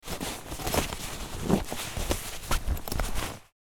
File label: Luggage suitcase movements foley